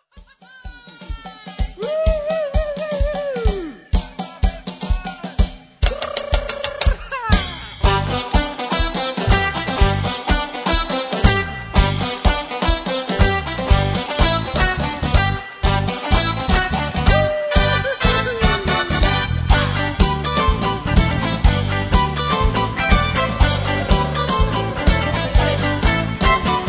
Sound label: Samba